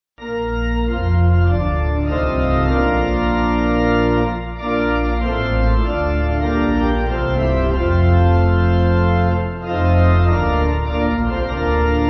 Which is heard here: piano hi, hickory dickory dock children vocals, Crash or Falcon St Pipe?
Falcon St Pipe